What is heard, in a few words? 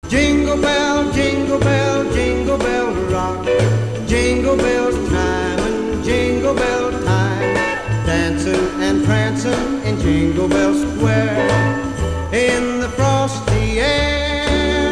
zm win